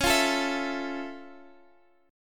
F Augmented Major 7th C sharp

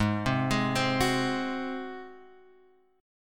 Ab 7th Ab down; Ab7 arpeggiated; JGuitar

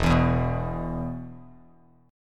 E 5th, Bass Standard; strummed; JGuitar

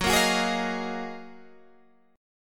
F sharp Major 13th Guitar Half Step Down

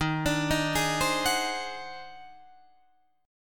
D sharp Minor Major 13th, Guitar, DADGAD

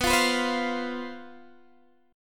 C Major 7th Suspended 4th, guitar

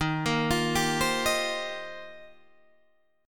D sharp 6th Add 9th, Guitar, Open D

